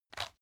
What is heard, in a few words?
pepper mill soft; gastronomie; soundpool